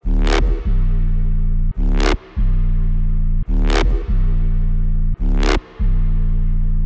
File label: Bass Loop